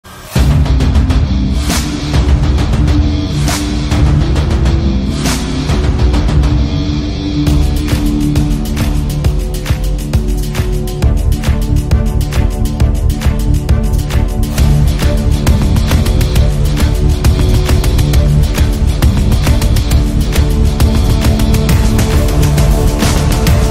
Cinematic Action Trailer background Music

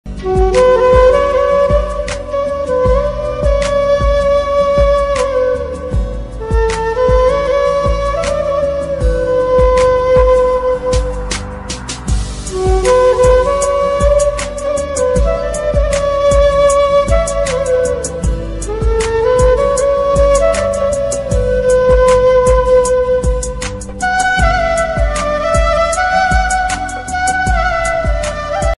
flute ringtone sad ringtone; bangla; বশর সর

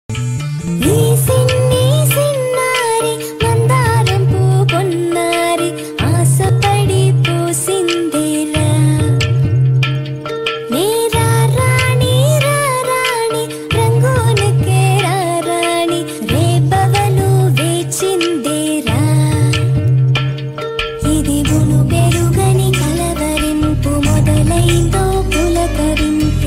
smartphone ringtone

